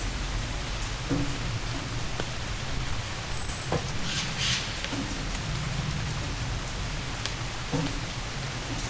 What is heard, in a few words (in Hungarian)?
esik az eső, szajkó, Aggteleki-karszt; allathangok; termeszetben